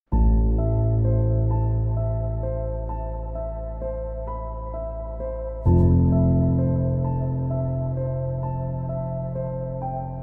Piano Version

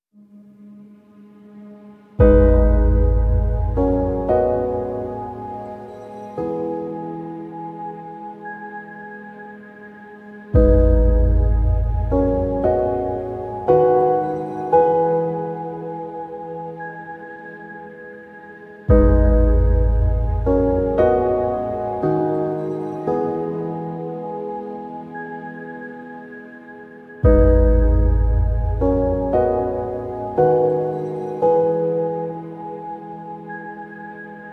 Piano

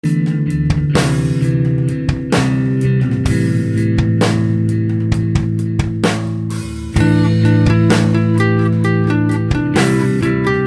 Acoustic

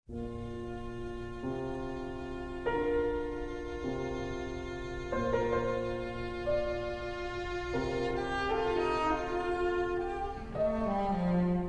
Cello Sonata In G Minor; BWV 1029

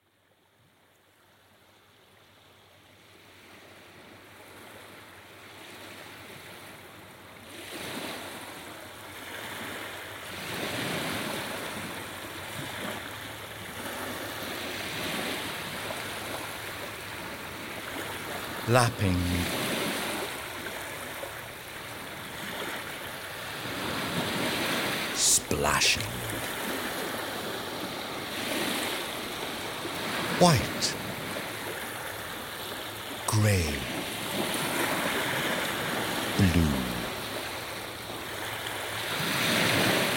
Soundscape Beach; Learning Resource For Children

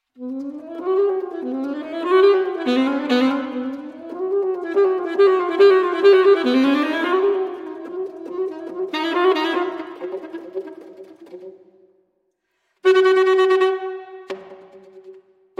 For Alto Saxophone; III. Gurgle

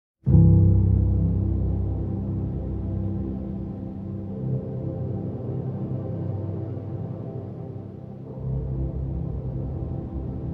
Instrumental

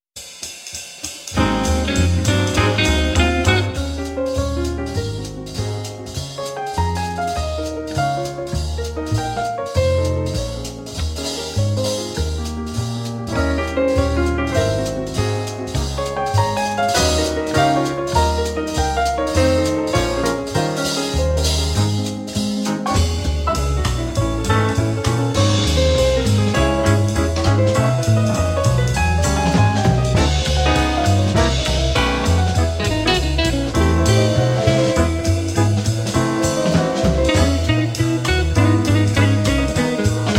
In A-Flat Major, Jazz Ensemble

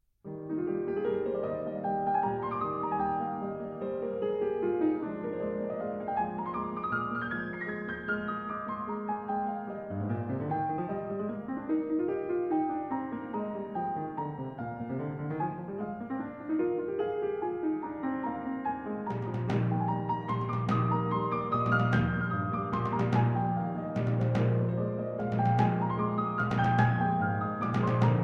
Dance, For Piano; Arr. For Piano From Symphony No. 3 in E-Flat Major, Op. 55

